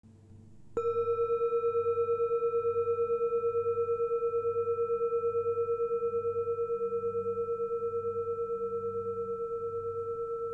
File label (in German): Doppeldorje Kopfchakra